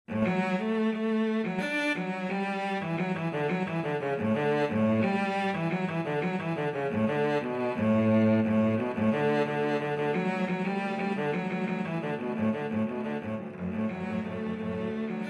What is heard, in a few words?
cello quartet